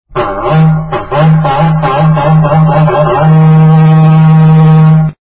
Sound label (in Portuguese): berrante